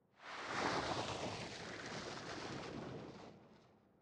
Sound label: sand wind gust; factorio